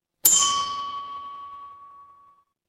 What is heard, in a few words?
instrument bell